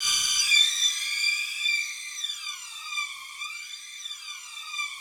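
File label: rough strings D5 right, Yamaha VP-1; 90 s Sample CDs; Music Store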